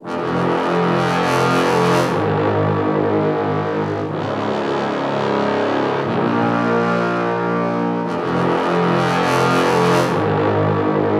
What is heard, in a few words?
Tubas - Fm 120Bpm; Construction Kits; STEMS; Ghosthack; SkyRealm; Kit 25 - Vvardenfell